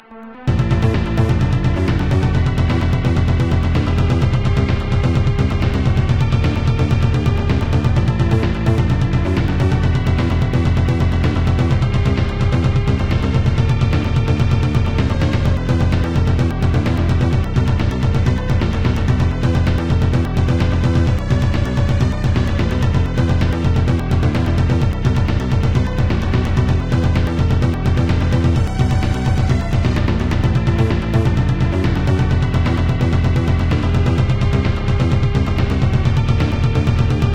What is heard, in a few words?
Music; Biometal; JP